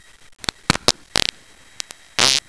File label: fart